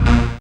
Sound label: synth a#, Rave Synths; Dance Synths